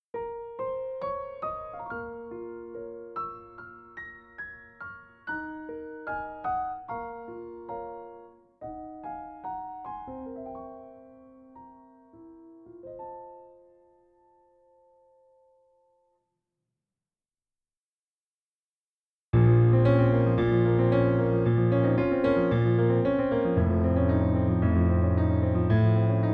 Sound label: Piano; Piano Collection; Katawa Shoujo